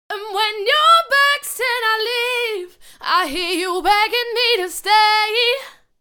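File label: 120 Bm, dance vocals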